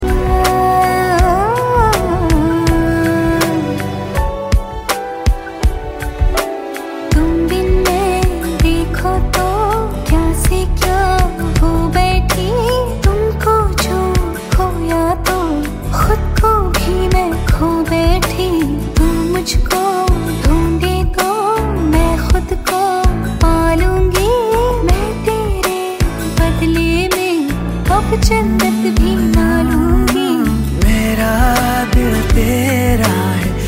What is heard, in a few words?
(Female) Ringtone, Bollywood Punjabi Pop